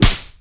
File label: hit face, skater; Tony Hawk's Project 8; XBOX